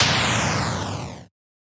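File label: sounds for notifications on phone in 8-bit style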